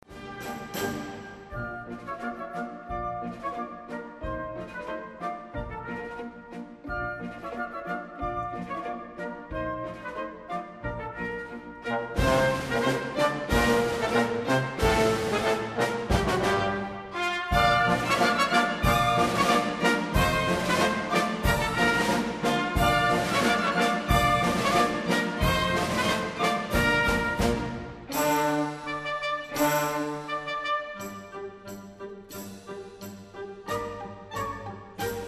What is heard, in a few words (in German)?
persischer marsch